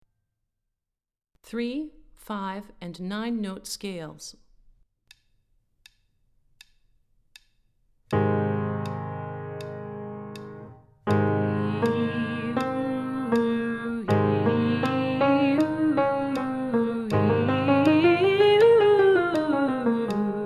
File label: Three Five And Nine Note Scales Low, warm-ups